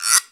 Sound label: GUIRO FAST